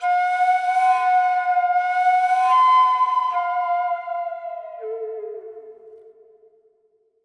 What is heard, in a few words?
Echo Flute; Flutes